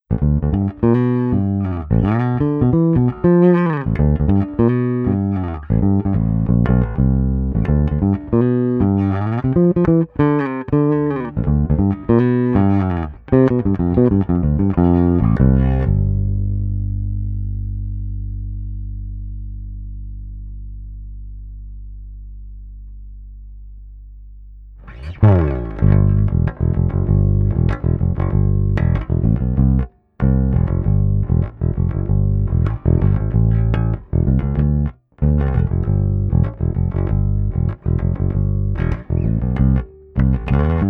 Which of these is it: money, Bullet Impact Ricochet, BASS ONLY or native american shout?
BASS ONLY